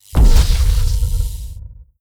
stellarite attack plasma; Stellaris; guardians

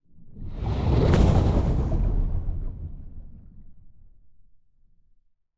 titan move; aquatics

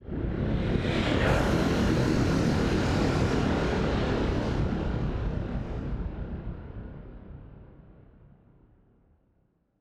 ship move; juggernaut; Stellaris; machineage

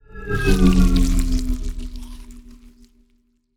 ant ship construction move; biogenesis

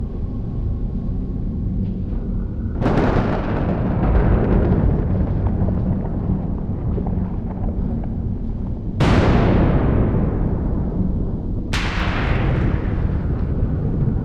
ambient space storm; System VFX

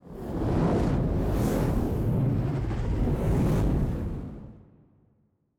kaiju superweapon fire swirl; biogenesis

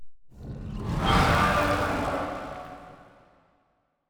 aquatics dragon attack mouth

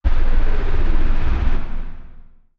distance auto cannon fire small; weapons; Stellaris

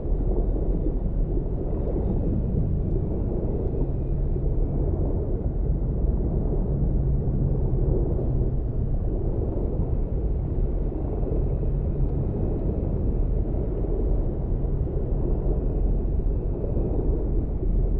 ship destroyer idle; aquatics

